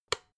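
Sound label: electric whisk turn on single, kitchen; haus-garten; kueche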